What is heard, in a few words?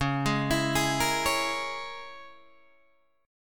Db Minor 6th Db down Guitar Open D